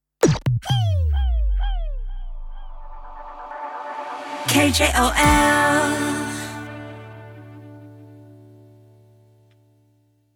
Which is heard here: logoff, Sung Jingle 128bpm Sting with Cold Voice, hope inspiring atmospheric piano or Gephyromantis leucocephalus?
Sung Jingle 128bpm Sting with Cold Voice